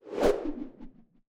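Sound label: infantry javelin, weapon; Battle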